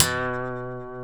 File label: C#, funk guitars; Spectrasonics; Hans Zimmer Guitars VOL-1; TAKA FUNK